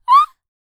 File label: Vocal, R&B & Dance, FEM. HUMAN; ILIO; Vocal Planet VOL-4